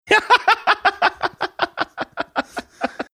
having good laugh

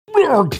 blurgh

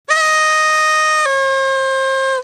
party horn

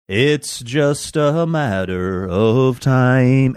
singing

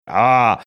ahh

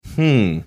hmm

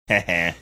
heh heh

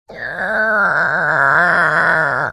making drop sound or velociraptor sound

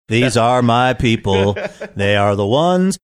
singing

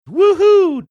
woohoo

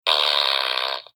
fart

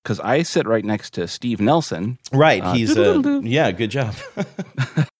whistle

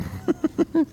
cute giggle

